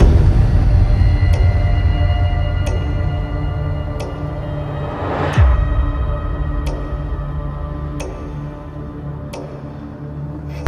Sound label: horror